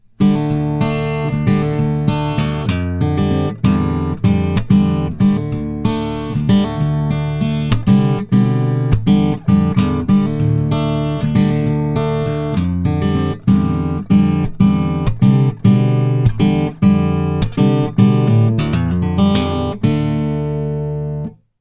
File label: Yamaha Transacoustic LS-TA Fingers Natural, guitarra acustica